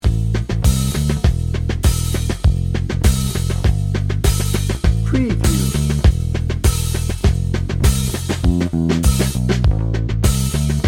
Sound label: dnb